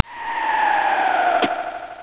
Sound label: fall